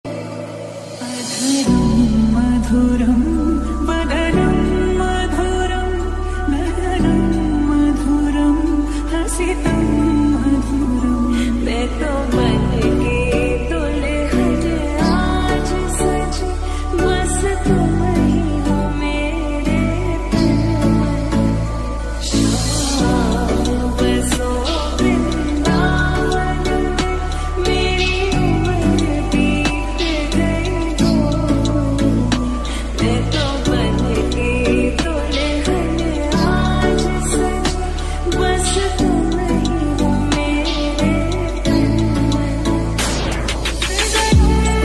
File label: lofi ringtone krishna bhajan ringtone trending bhajan ringtone; shree krishna bhajan